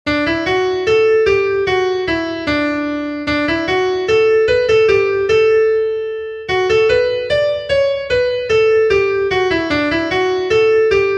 SOUTHERN KINGS MARCH, fiddlers

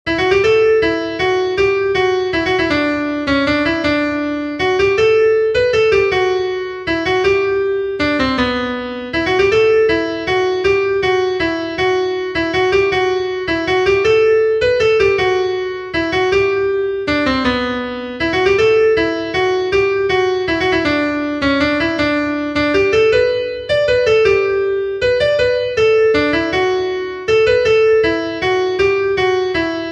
WALTZ, fiddlers

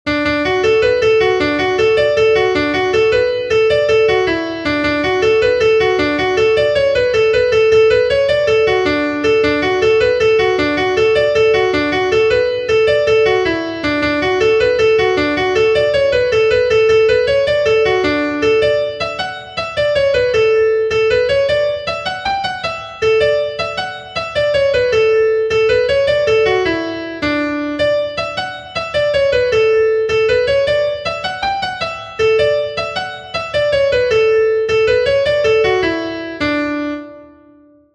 RUSSELLS JIG, fiddlers; southern kings fiddlers

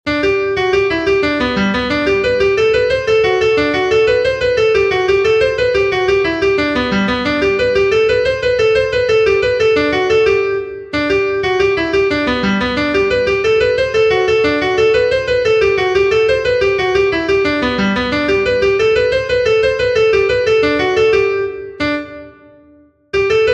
REEL, fiddlers; southern kings fiddlers; ANGELINAS

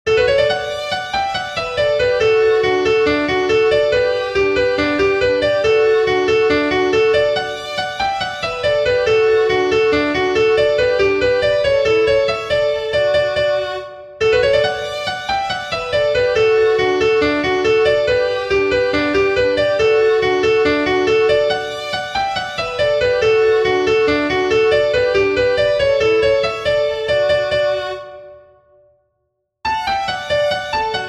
ST. ANNES REEL with harmony, fiddlers; southern kings fiddlers

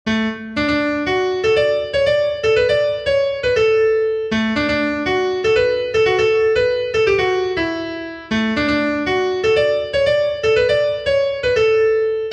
SCHOOL MARCH, fiddlers; Save the BELFAST SCHOOL MARCH; southern kings fiddlers

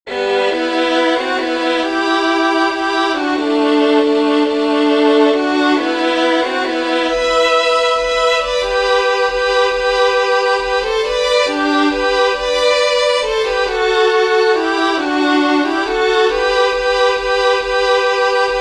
Scottish Air, fiddlers; When she cam ben

